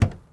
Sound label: Truck Ford250 Door Open; Trucks